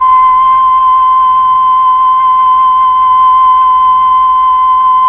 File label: FLT, Melotron Flt, Tron Flutes